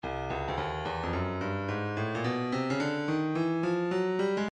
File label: breakbeat 108 bpm; odd thip thugs b; Stylus Breakbeat Midi Files